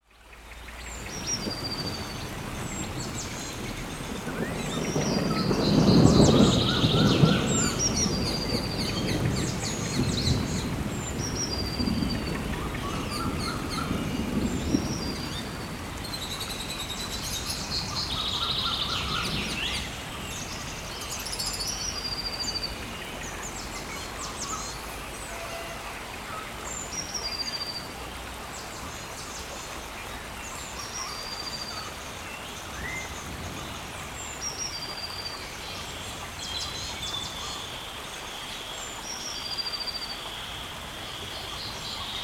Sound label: Rainforest sounds